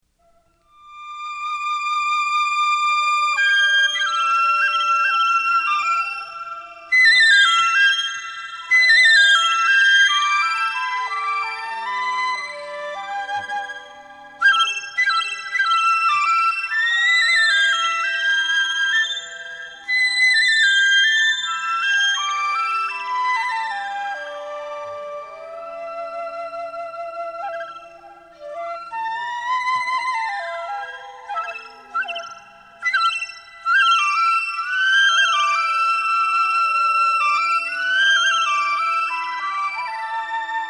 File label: Flute